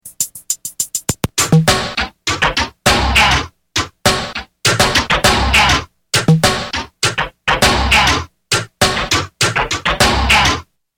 Processed Loop; Charisma

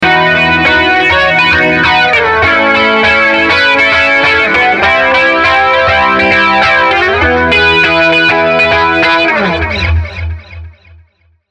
NI GR2.2 Flanger

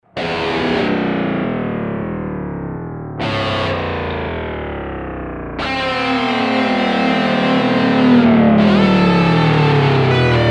Eventide MicroPitch Going Down

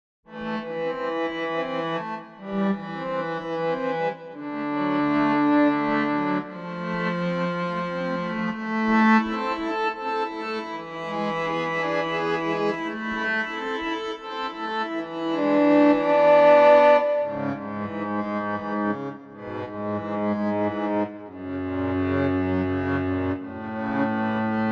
Double Bass; STG

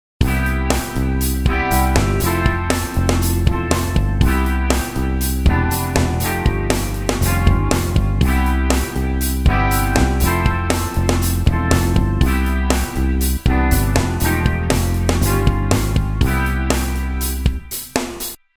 Prominy B Clean

